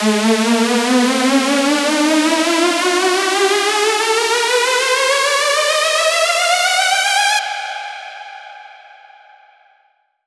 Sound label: Saw Ab 4 Bars